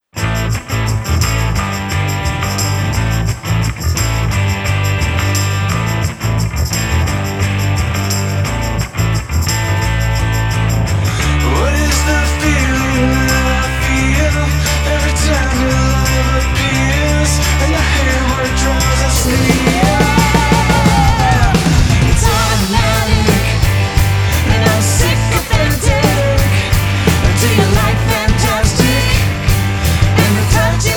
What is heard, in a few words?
Blues Rock